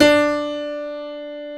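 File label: piano D3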